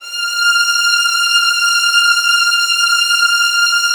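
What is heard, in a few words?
violin; Virtuoso Strings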